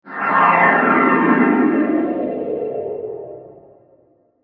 Whoosh Slow Heavy Tonal, Whoosh To Hit Reverse Eerie Metal Scrapes; Blastwave